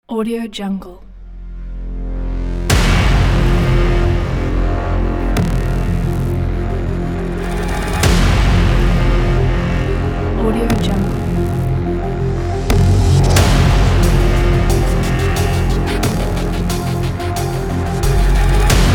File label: aggressive cinematic hybrid trailer